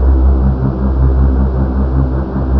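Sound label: ambience soft fan; tank farm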